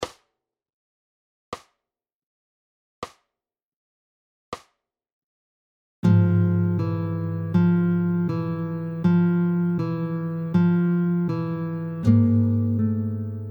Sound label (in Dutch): oefening tempo 40